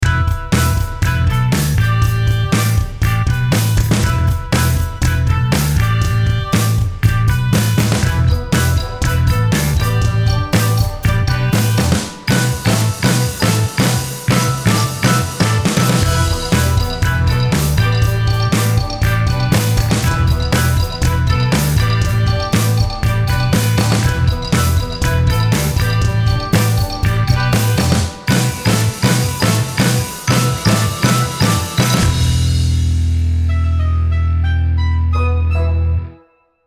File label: Oboe Rock Thing